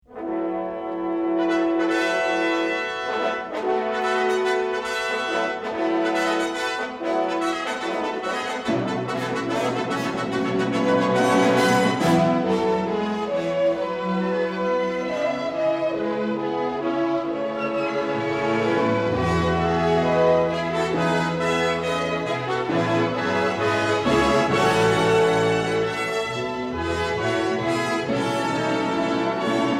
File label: Fanfare